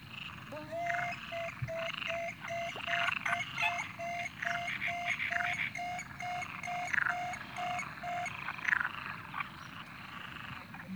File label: Frogs, Great Marsh Warblers Coots